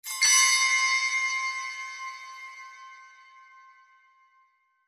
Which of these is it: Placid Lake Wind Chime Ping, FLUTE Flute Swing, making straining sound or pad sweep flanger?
Placid Lake Wind Chime Ping